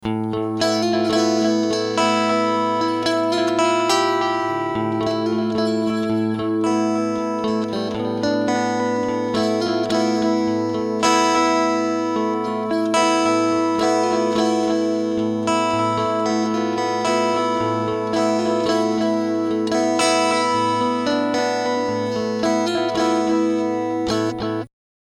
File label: DL 5 Slapback